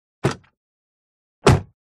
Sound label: Car Door O C Solid